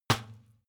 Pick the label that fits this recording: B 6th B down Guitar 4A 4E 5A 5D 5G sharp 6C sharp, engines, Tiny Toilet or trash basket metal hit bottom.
trash basket metal hit bottom